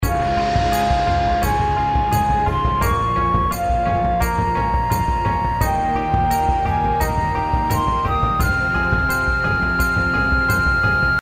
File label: outro bgm; ChiYou